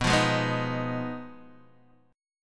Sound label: B 6th Flat 5th B down Guitar DADGAD